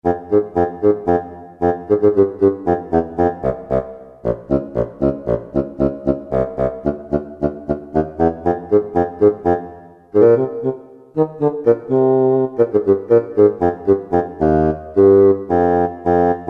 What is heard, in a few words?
Solo Basoon; Factory Preset examples